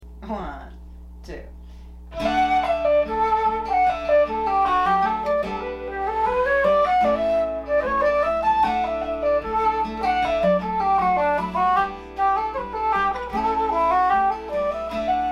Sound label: flute and guitar D